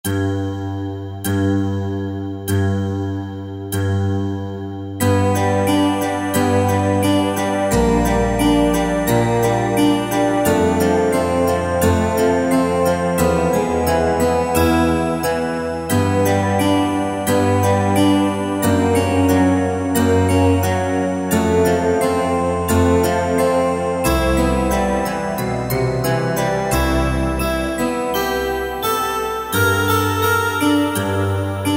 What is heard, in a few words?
Guitar, Kurzweil K2000; Magic Guitar; Kurzweil K2000 Value Pack; Extra Programs; GUITAR1X